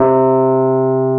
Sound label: AMB ROAD, Keyboards Old School